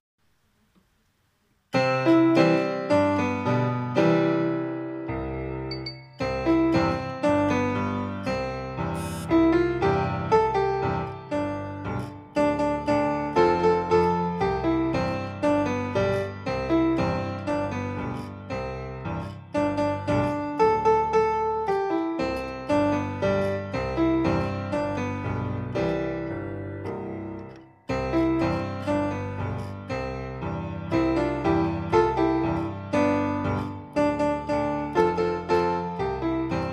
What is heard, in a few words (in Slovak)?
klavír